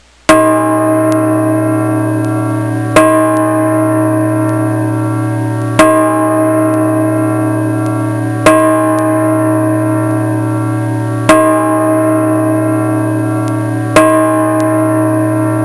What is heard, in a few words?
Church Bell; Soundsation